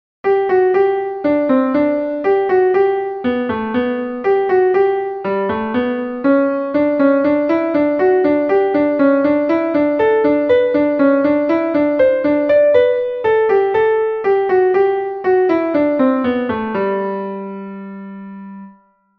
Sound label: Brandenburg Concerto, classical piano